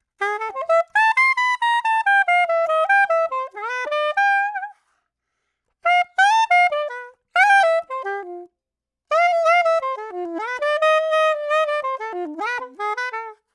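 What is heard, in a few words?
070 Latin Jazz Soprano Solo A minor; Brass; Digidesign; Pro Tools; Pro Tools Essential Loops; Sax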